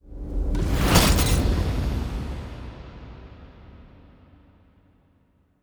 ship construction ship move; Stellaris; toxoids; ships

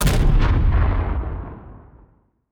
kaiju lightning hit explosion; Stellaris; biogenesis; weapons

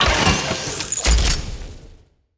ship designer add weapon; Stellaris

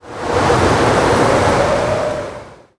artic; ambient; Stellaris

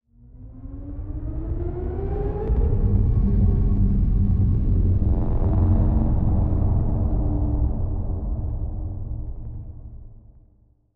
ship titan move; Stellaris; shroud; ships

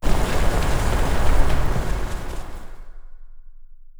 alpine planet; ambient; Stellaris

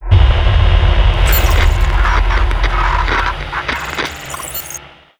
first contact machine; Stellaris; nemesis

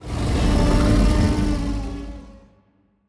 kaiju thermoclastic roar; biogenesis

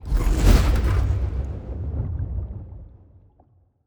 ship juggernaut move; aquatics

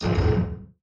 distance disruptor hit, weapons; Stellaris

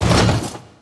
moving pop confirmation; Stellaris

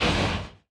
distance arc emitter fire, weapons; Stellaris

